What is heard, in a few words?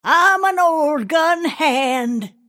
move pain; Snapfire